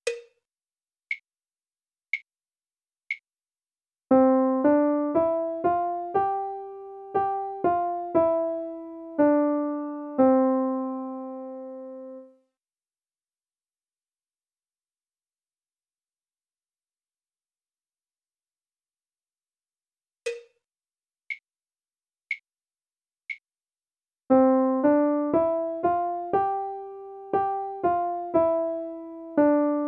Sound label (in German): Melodie